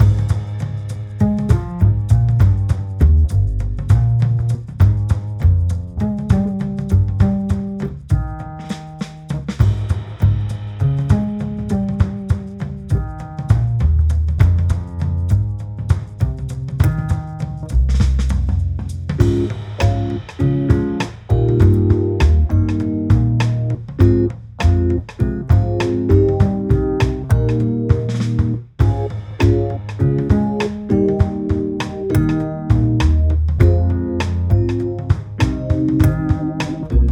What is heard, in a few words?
Jazzy Gameplay Loop